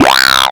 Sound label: Synths Root C; Synth Shots and Basses; Vengeance - Essential Clubsounds Vol.4; Note C